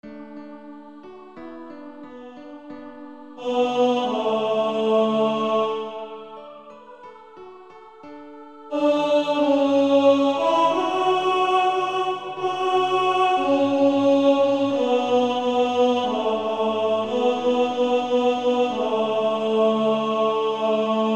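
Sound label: with Piano; Somebodys Knocking at Your Door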